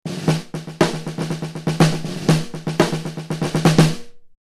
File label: kent blue sparkle studio